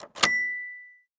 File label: transaction, phonetones; LG V60 ThinQ